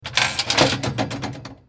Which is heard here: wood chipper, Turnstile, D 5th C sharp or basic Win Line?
Turnstile